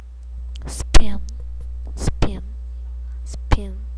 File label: spin